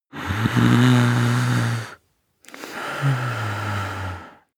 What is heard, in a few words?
breath male moan